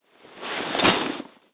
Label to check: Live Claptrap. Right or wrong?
Wrong